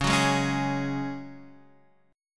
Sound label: Db Suspended 4th, Guitar, Half Step Down